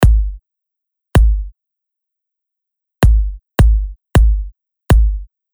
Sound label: Bassdrum